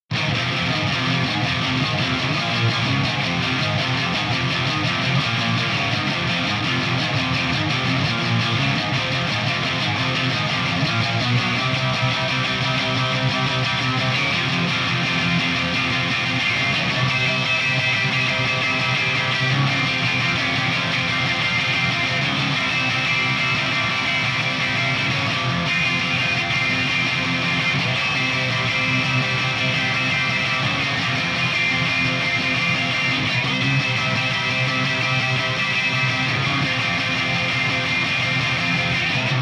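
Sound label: rhythm guitars processed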